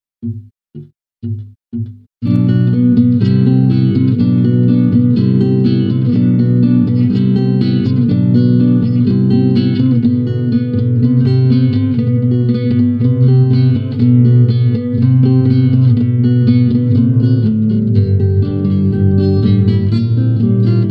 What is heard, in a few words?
Acoustic